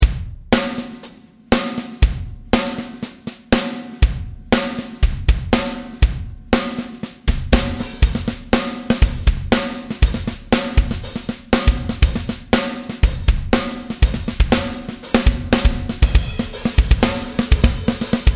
hihat

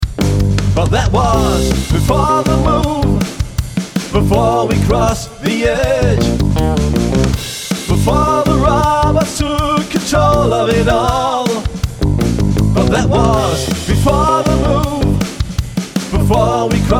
speed tom

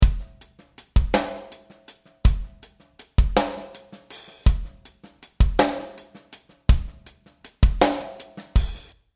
realverb drum

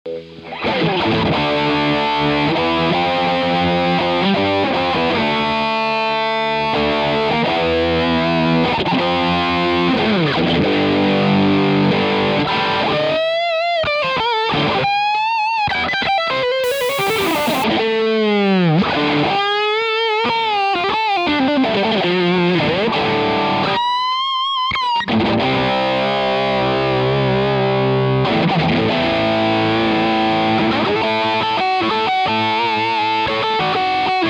orange ampsim; guten rock sound

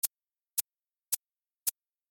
mono sample, snares

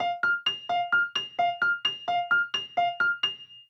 piano pan

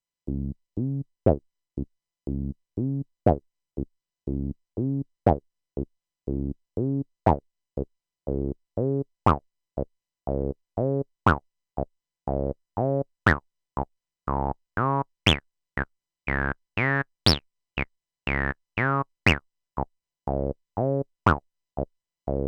bassbott square 16 bar, techno

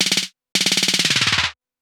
snare pitch; pitch automation; hier bei 0.40 dieser pitch automation - transponieren? oder eher einzeln zerschnippe

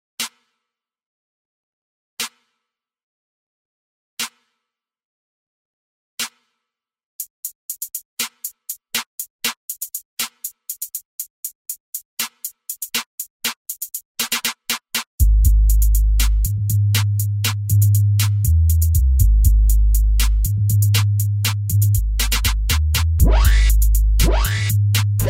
trapper beat

